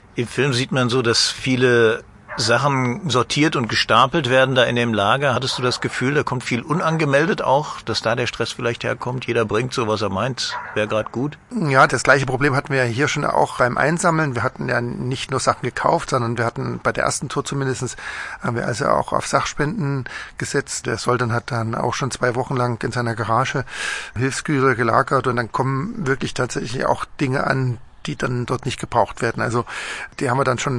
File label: classic brass